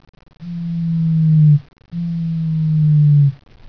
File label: Notocera, treehoppers; Cocroft